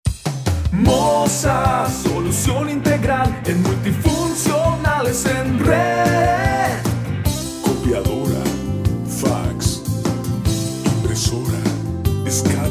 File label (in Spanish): Jingle Institucional; Mosa